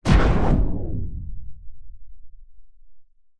sound design cinematic hit crack bolt impact into a descending slow tail; zapsplat